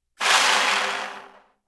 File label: breath